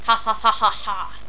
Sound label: hahahahaha